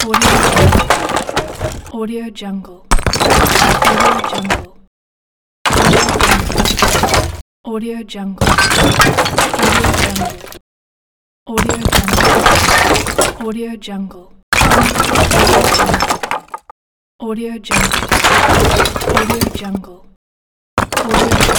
brick stone wood falling short

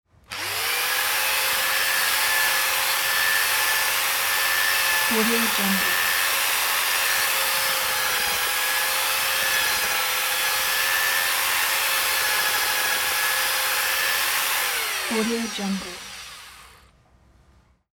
mixing dry mortar with drill

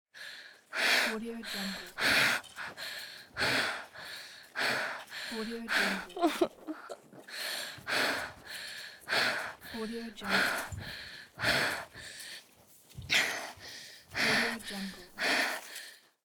breath woman

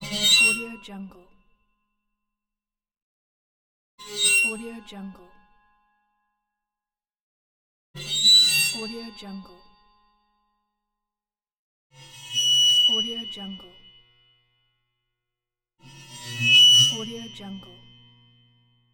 short string bow squeaks

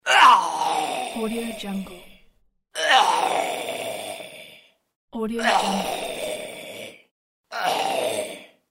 dying zombie

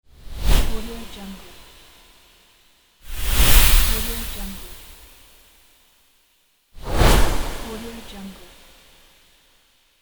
swooshes; mega-loop

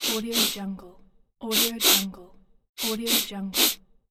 sniff

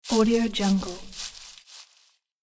leaves rustling

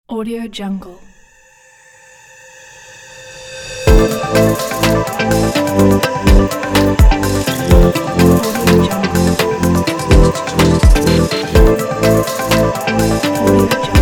dubai pop logo

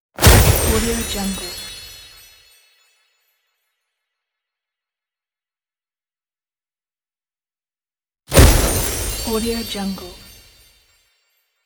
magic sparkle burst

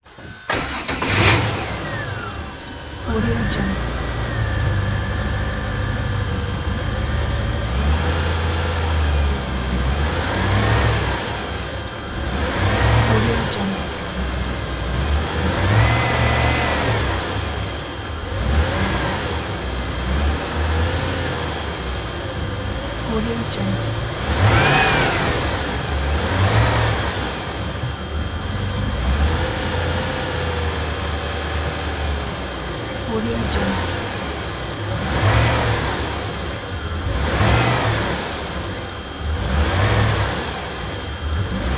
boat idle

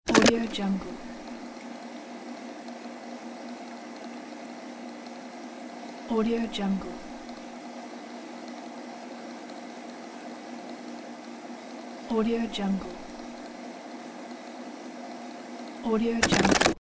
analog tape recorder play; sound-dispenser

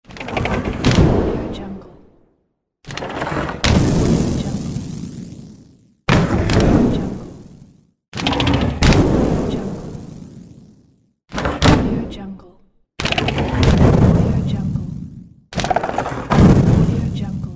catapult

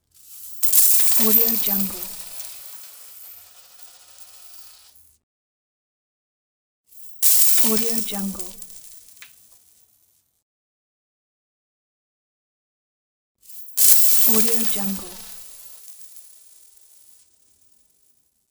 coins falling pack

